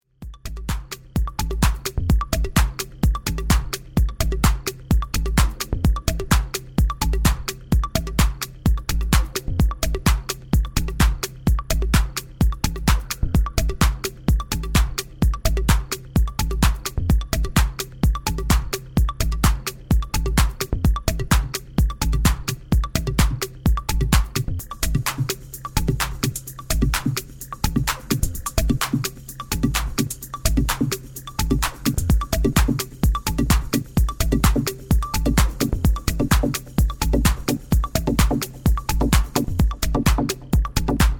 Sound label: internal funk